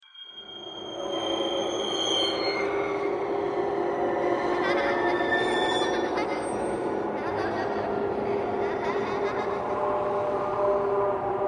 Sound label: Halloween Ambience